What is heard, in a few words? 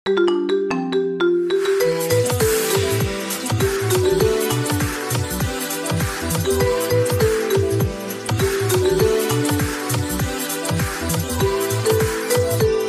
Marimba Remix